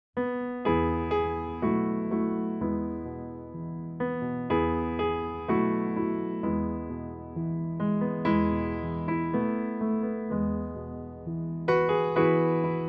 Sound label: sabbath school is over backing